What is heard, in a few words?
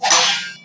block; weapons; darksouls